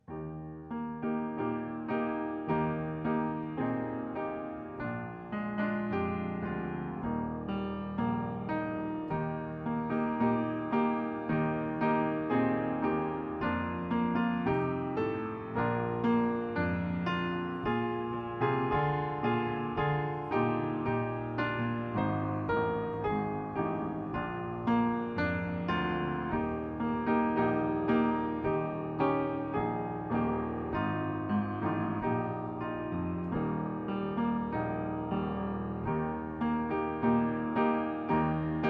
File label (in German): Vorspiel